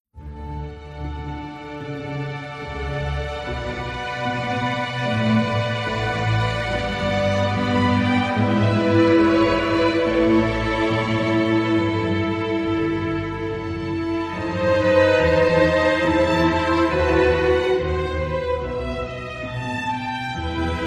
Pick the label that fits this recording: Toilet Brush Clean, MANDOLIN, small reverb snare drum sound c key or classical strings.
classical strings